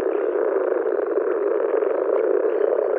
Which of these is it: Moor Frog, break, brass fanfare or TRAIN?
Moor Frog